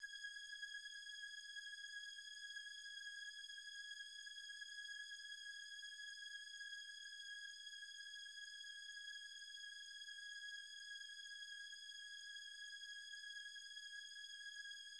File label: 128 BPM G# Min String; Loop Stems; Cymatics; Breakdown Loops; Fuse